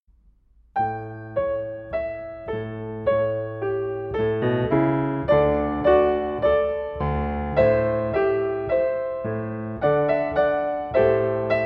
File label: live piano accomp; In Harmony teacher resources; EYFS and Key Stage 1